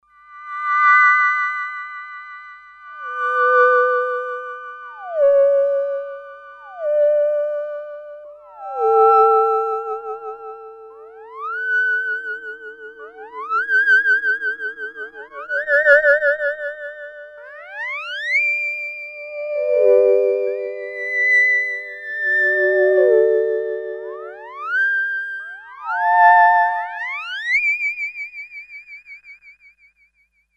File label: Angelic, Sequential Circuits Six-Trak; Factory Programs